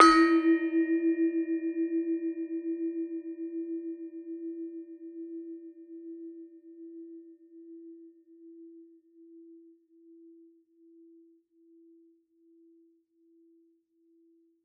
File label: Gamelan E3; Gamelan Chromatic One Hits Long 24 Bit; gravityharps; timbrebox